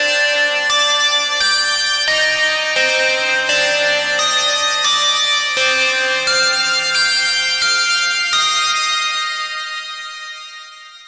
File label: Vibes, Drum n Bass; KJ Sawka; Drum n Bass & Beyond